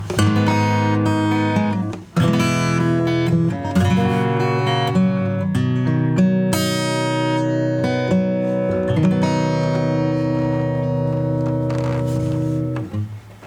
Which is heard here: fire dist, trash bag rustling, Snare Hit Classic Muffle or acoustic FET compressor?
acoustic FET compressor